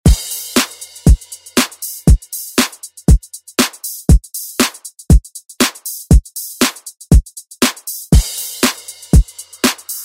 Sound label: 90s ReDrum; DJ Mhark